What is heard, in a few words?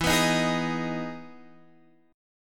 E Major 7th E down Guitar Open F; EM7 strummed